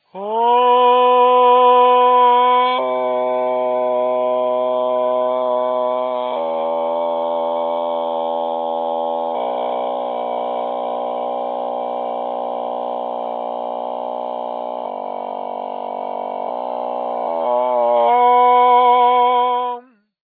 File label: fry subharmonics; Tutorial Sample Files